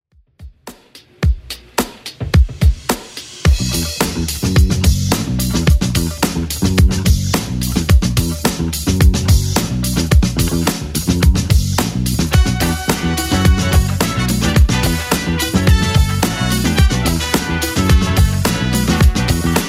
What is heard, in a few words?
80s Dance Redrum 108bpm